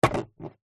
foley knife serrated stab into empty plastic milk bottle